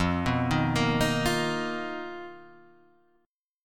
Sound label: B Major 7th Flat 5th F, chord shape